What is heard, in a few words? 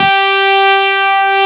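Wurlitzer G3 - L; USB Soundscan vol.09; AKAI; Keyboards Old School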